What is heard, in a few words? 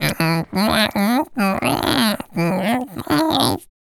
VOICE FX, Dance Vocals